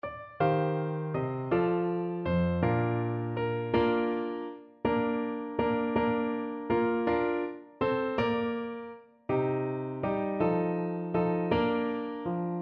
Clarinet

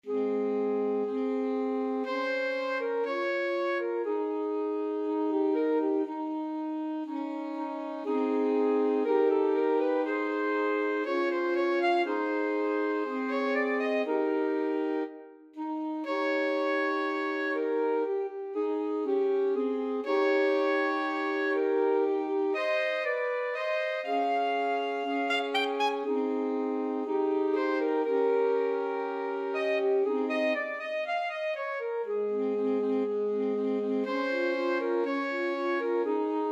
romance alto sax trio

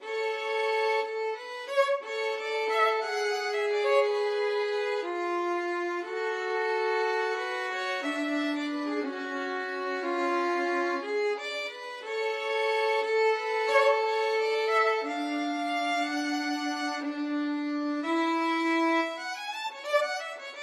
duos adagio violin duet

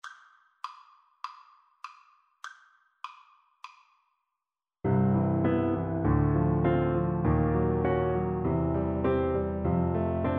piano duet

